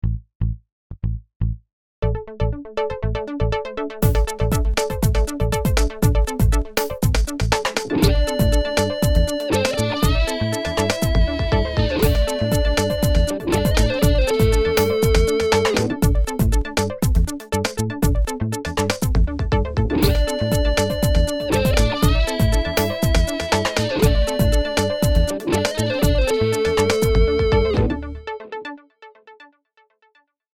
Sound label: dance